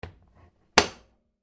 household washing machine door close; Appliances; McKinney Sound

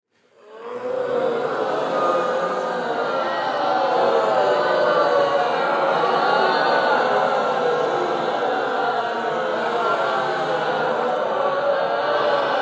Human Crowd Studio; Zombies; Reactions; Horror; Blastwave

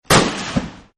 AK47 Shot; Assault Rifles; Guns

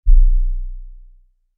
Lfe Hit Ultra Low; Production Element

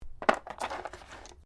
dice medium cardboard box pickup; Variety of dice sounds; Casinos

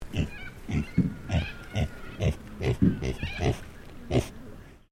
Animal Pig Tamworth Grunt Sequence; Hamish; Edgar's Mission Farm Victoria; Pigs; Animals

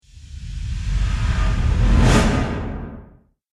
Cinematic Reverse Whoosh; Whoosh Sound Effects

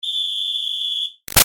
Whistle Sports Hard Blow Med; Miscellaneous Sport Sound Effects

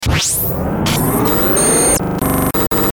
Stinger Power Up; Stinger Power Up Glitch Stutter; Stingers

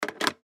Telephone Hang Up; Office; Telephones; Electronics; Blastwave

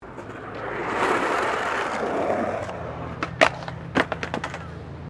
SKATEBOARD FLAT JUMP; Skateboarding; Sports

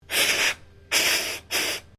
Ships horn broken; Boats; Vehicles; Sounds onboard HMAS Castlemaine

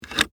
Metal Handle Down; Foley; Machinery; Machines; BlastWave

